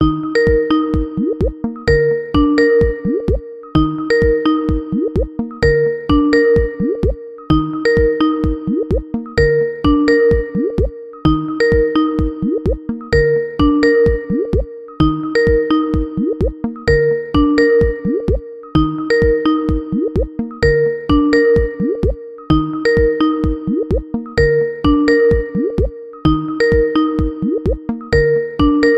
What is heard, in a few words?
Skype Ringtone